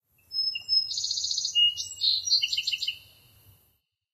Amb Birdvillage Birds

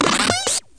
chatty creature; Gonner

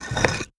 press cube

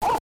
Bark!; Duck Hunt

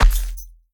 Footsteps Dirt Run; The Town

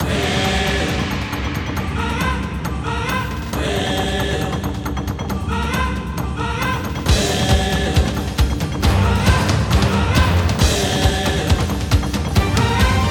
BGM Field Start Tower; God Eater Resurrection; PlayStation 4

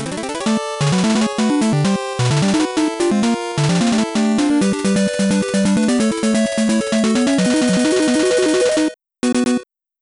Final Victory; rockman 8; famicom nes windows

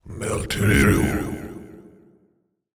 Death Vo; portal quest; android; Dumbledore

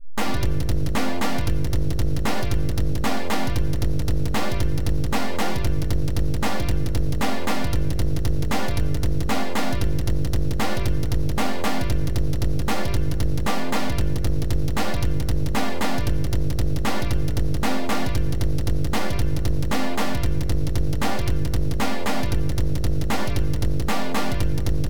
Pre-Launch Super Jackpot Collected; Getaway: The High Speed II; Williams; pinball; arcade

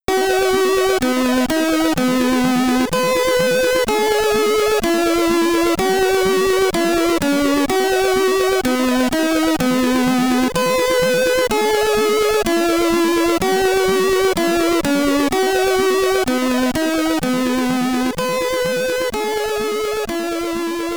Outro (SID Stereo); nova 2; commodore 64

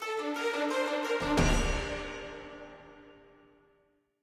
Music Action; China; Mast Collapses

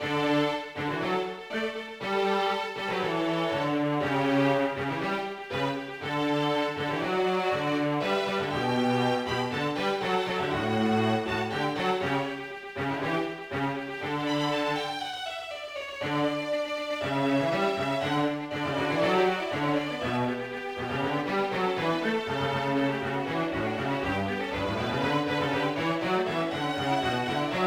Classical Stage Karaoke; Pinky Street Kira Kira Music Hour Pop Princess; Classical Stage 2

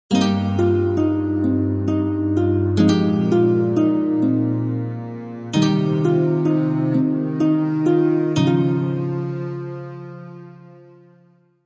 Medieval Calm; Civilization Revolution; Xbox

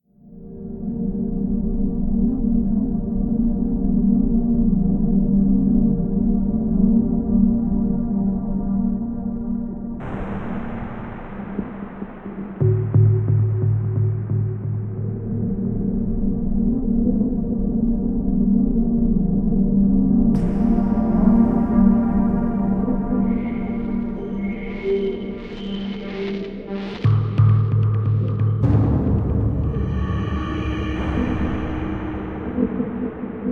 Tubular Stinger; Scourge Outbreak

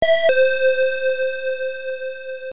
Correct; quiz marugoto the world pc engine cd

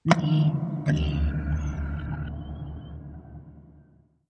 whale

